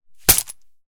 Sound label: Coin Purse; game inn